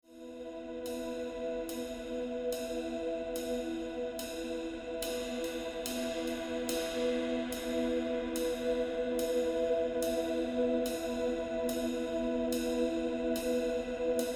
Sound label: rhythm